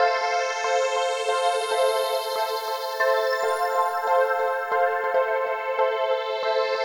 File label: Pad; Dance